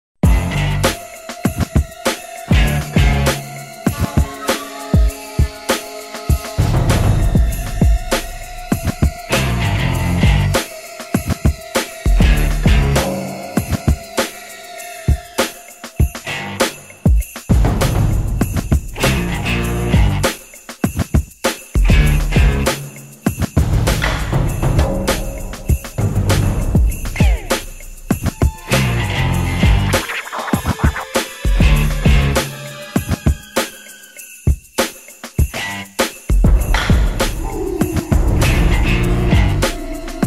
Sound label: Fight Groove; Afro Samurai The Game OST; PS3; Xbox 360